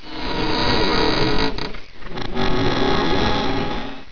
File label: door open